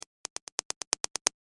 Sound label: A Series Of Clicks